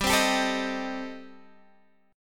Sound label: C Minor 6th G, chord